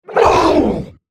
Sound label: dying